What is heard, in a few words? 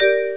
End page; ventafaxvoice